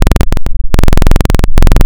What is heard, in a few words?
Classic Saw, wavetables; Architecture 32